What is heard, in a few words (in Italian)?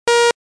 suoneria beep